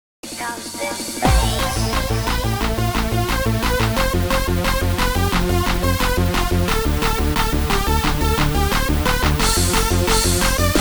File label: Taiwan Melody